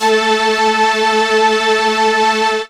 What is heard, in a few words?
lead syn, Techno Trance